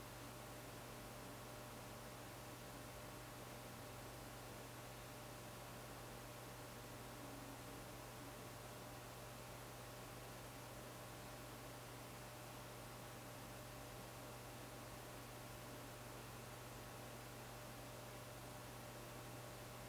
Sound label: indoor small room; teardown; ps5 windows xbox series xs